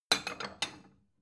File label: plate small shelve soft